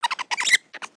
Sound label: Pets Skunk Happy; creatures pets; Lego